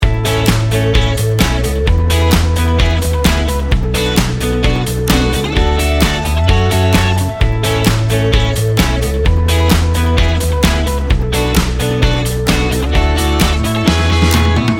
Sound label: hearts inspired loop